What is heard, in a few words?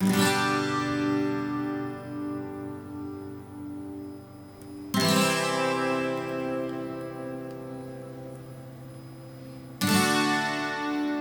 karaoke; music smorgasbord